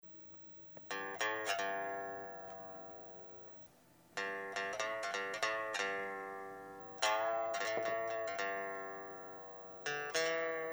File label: cigar box guitar noodling